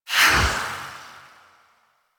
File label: Wisp Movement Dash; Warframe